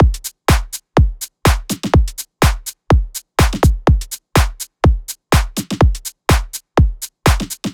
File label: House Drum Loop 124 BPM; 99Sounds x Monosounds; Dance